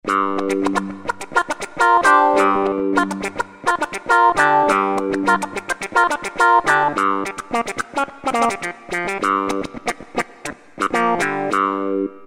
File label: ClaviWah; XG Voice examples